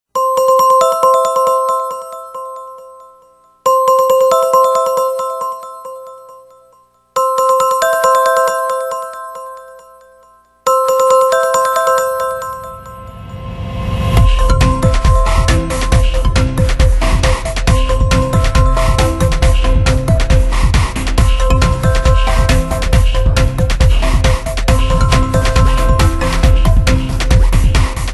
Ring; T-mobile; HTC T-Mobile Shadow

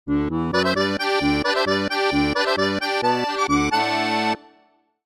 oktoberfest accordian polka; Google; Nest ringtones

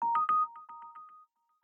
system notifications

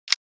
Close, Nokia E75

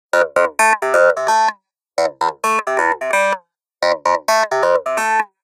Ring; Windows Phone 7.5

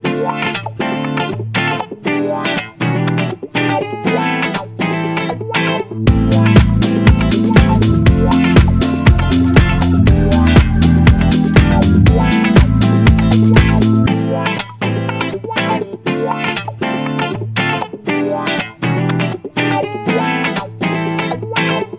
House; MyPhone Agua Rio

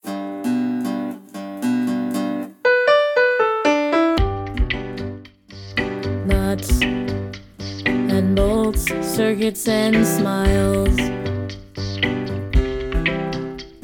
Robot Envy, ringtones

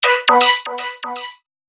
Router alert; Sony Ericsson; PlusFourSix; SE Sound Project; Lars Edvall

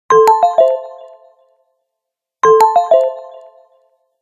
Ring; Microsoft Windows-Phone-7

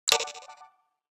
Chat message tone; Nokia C6-00

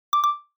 camera focus, ui; Alcatel Idol X

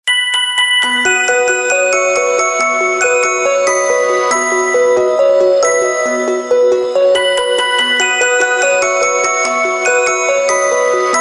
Castle Gate; Samsung; SGH-G400F SoulF

